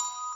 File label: Bells